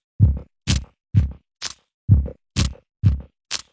127 bpm microscopic analysis, drum loops and breaks